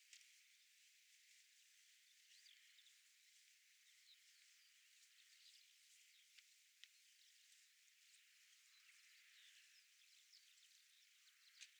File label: DRONES